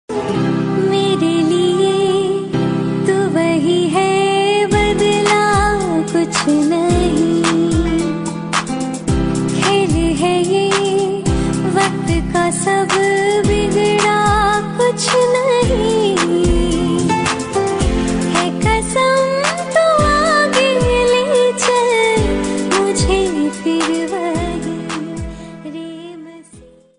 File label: female ringtone